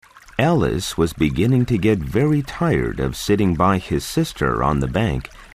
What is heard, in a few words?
error